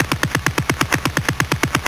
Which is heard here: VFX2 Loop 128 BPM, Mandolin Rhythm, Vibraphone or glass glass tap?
VFX2 Loop 128 BPM